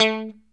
guit; guitar

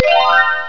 sparkle; TinyTown

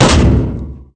body fall metal; Spearhead Demo; MacFormat 2003 June

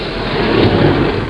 sliding; Sound Effects for Multimedia - Volume 1

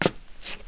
stone shuffle; Player; Heretic II Demo

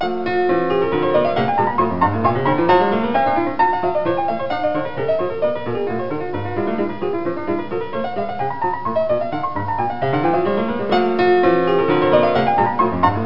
concerto

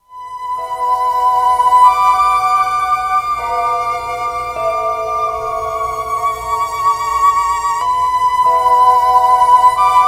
Orchestra; Sonic Foundry

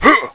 jump; quake

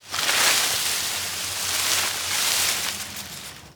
Bush Move; 1944 Battle Of The Bulge

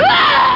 GRUNT

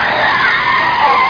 ski; NewSurfs

